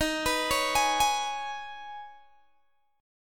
D sharp 7th Suspended 4th Sharp 5th, Guitar, Open G